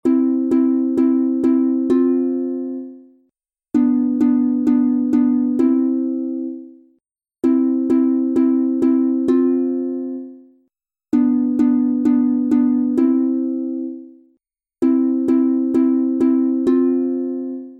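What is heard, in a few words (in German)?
C Dur 2 stimmig instrumental, Harfe; Morgenlieder; Morgens frueh um sechs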